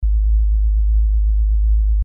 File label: 55 Hz; Fréquences fondamentales